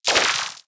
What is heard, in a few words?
Sci-fi Energy Gun Blast